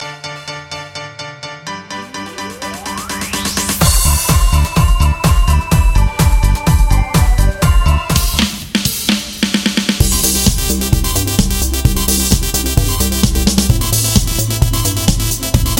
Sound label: Pop Jingle Radio